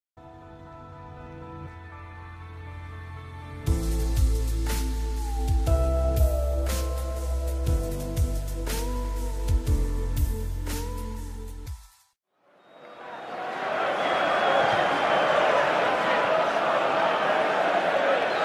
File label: football crowd sound effect small stadium